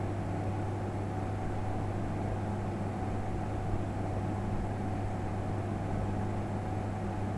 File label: Ambient Cafeteria; Among Us